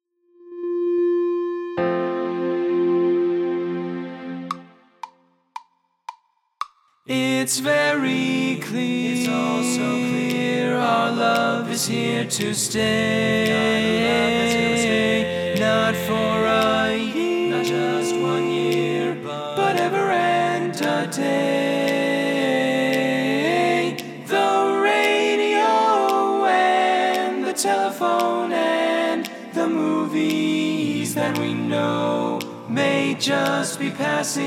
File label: Tenor